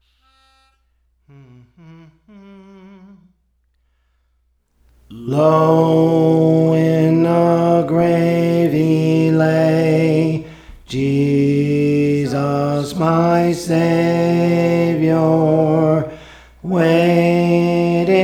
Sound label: Alto; Praise For The Lord Training; Low in the Grave He Lay